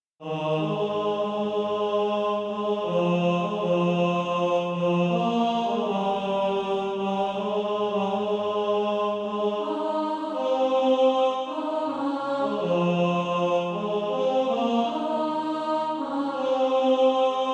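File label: tenor, Practise Music; SATB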